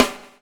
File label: BUBL, BUBBLE; Spectrasonics - Backbeat